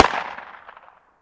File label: m4 far; superb warfare